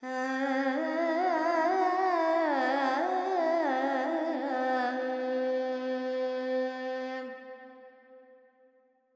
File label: Carnatic Female Alaps, Panthuvarali, 100 G, Verb